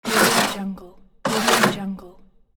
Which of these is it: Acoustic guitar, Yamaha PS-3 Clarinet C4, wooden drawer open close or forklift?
wooden drawer open close